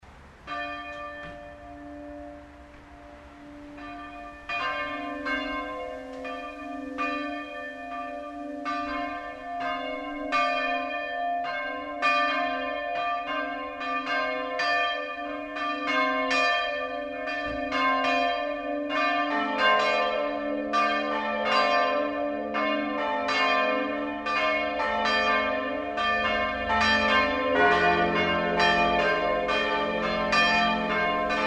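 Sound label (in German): Tonaufnahme d1 f1 a1 c2 d2, mehrstimmige Geläute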